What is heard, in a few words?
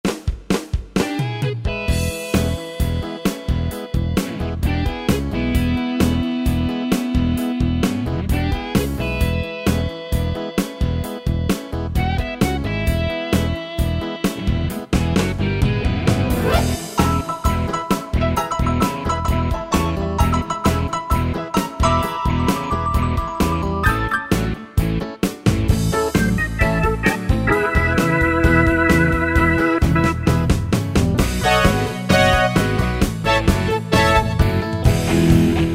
Southern Rock, Yamaha PSR-3000 pop & rock style